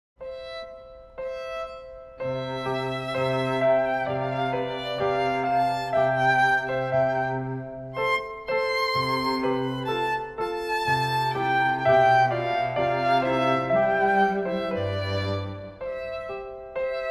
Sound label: Piano Trio